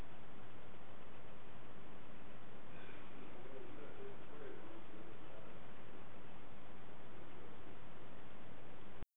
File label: Possible Breath